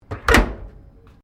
Door Old Truck 1920S Close, Old broken truck doors; Miscellaneous Doors